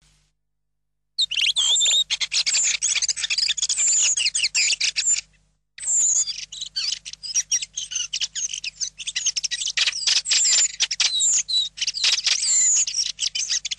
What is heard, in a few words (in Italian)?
Tordo Sassello